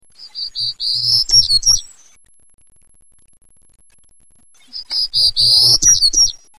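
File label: Townsend's Warbler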